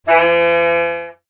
mystery

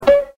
BOOP

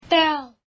BELL

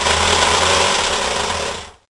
BIG DRL

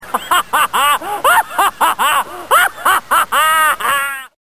laughs

